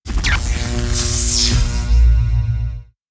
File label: Stereo Laser, notifications; Lenovo; ZUI 13